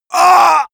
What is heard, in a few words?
male hit; menschen; schreie